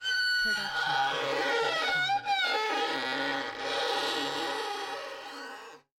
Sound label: Creaky Screetchy Noise Long; soundscrate